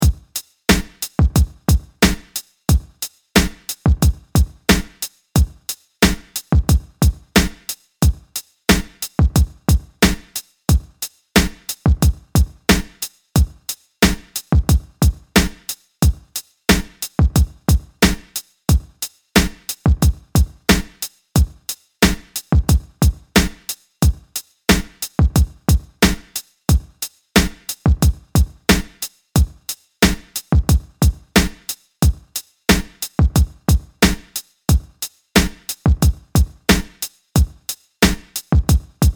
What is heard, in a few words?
Drumloop; ableton-live; beats